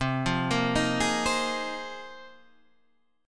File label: A sharp 7th Suspended 2nd Suspended 4th